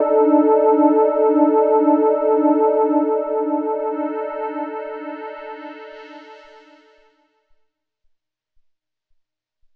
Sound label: Zap Chord, Pads; Drum n Bass; KJ Sawka; Drum n Bass & Beyond